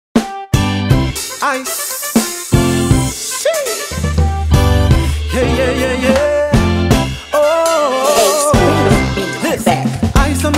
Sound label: Oriental Choir